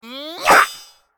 Character Attack

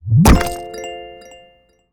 Jumbo Explosion; Ellen's Garden Restoration; android

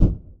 Poof; blastmorph pinball; xbox series xs

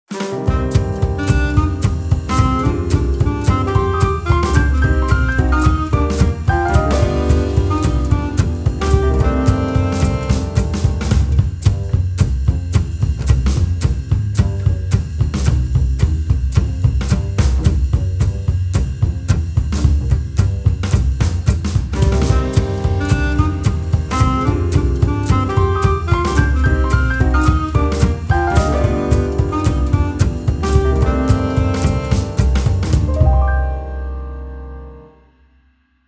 JAZZ-THEME; onirism

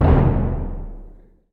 B Drum

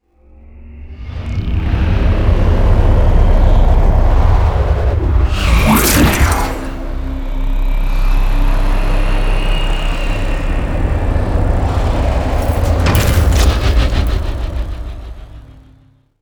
Foley Boss Appears

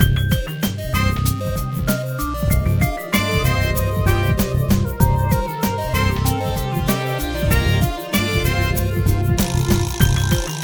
Tournament Loser Ditty

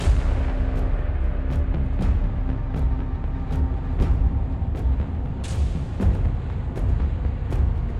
Mood Tension Light

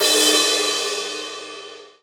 Hi Crash

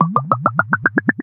Glug Glugh Drinking Pop

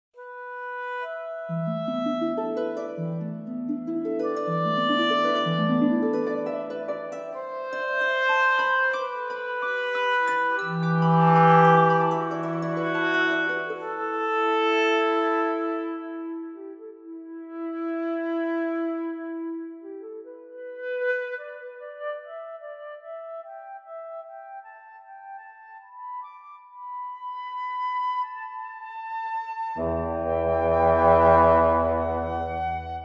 Camp Wind

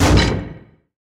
Prison Door; Prison Architect

